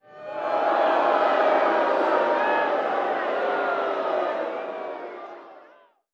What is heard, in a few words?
Crowd Large Crowd Boo